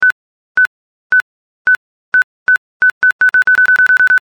Detonator

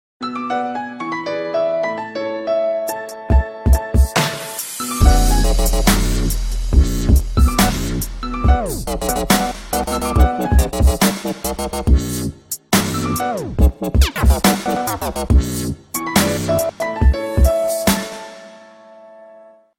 Nokia Tune Dubstep Remix

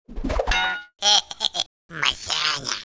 Startup

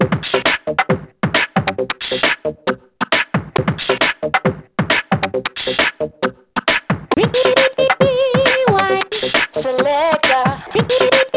2Step

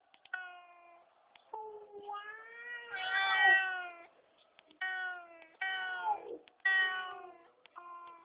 Meow Hip Hop

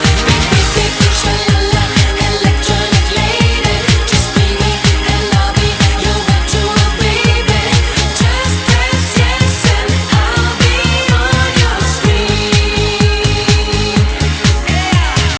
electronic lady

